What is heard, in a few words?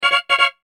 Musical element notification organ hits; BLASTWAVEFX